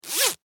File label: Foley Zipper Open Small; Zippers; Objects